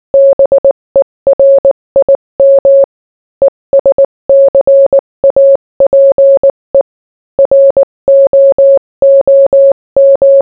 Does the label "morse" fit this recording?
Yes